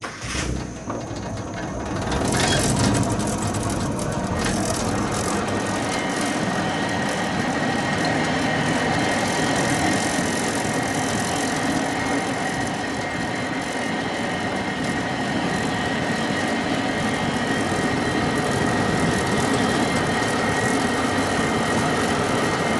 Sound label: cable car ride; sonniss gdc